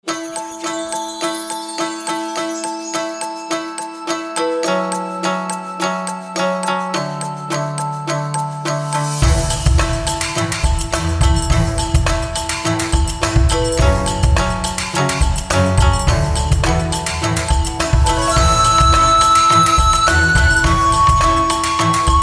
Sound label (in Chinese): 古典中国风, 宝藏背景音乐